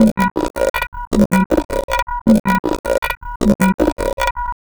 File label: tabla, 105 bpm; Zero-G; Pure Tabla